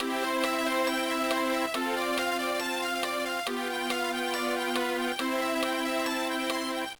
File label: Round Piano; Level 2